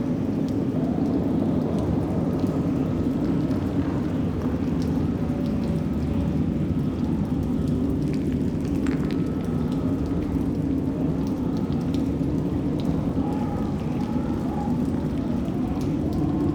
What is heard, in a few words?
Outpost Cave Amb; Warframe; Corpus; Outpost Defense